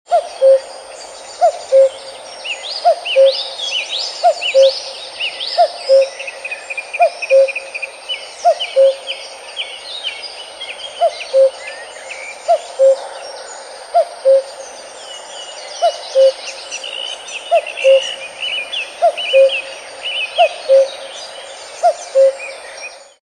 cuckoo bird sound ringtone

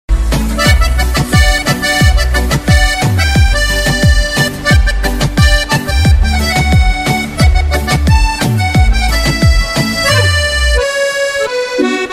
accordion ringtone

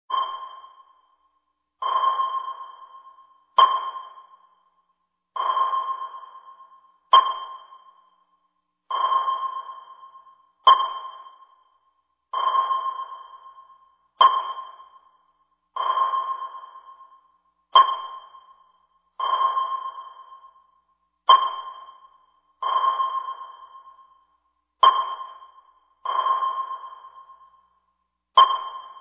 submarine radar ringtone

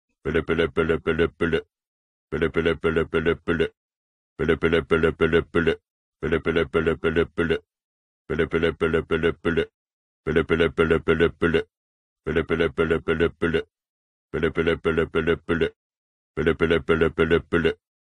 escargophone ringtone

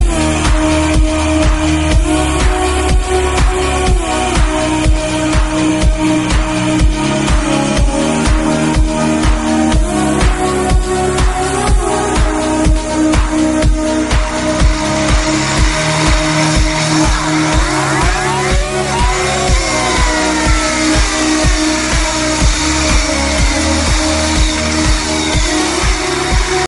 moto gp ringtone

